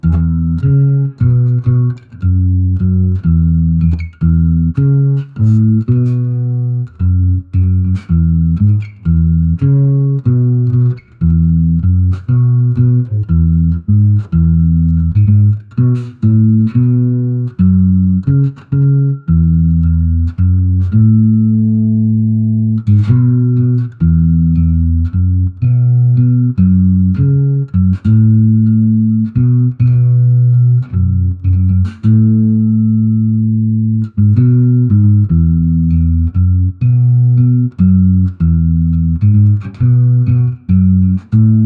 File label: acoustic bass riff